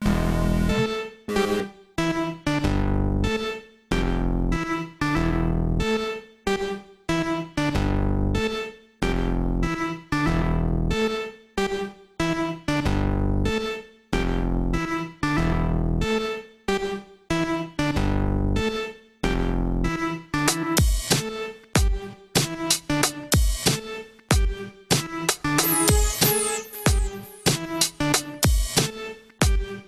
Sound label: live broadcast improv